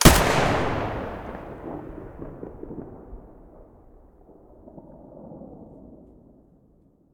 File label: ak105 muzzle stereo, weapons; STALKER; IWP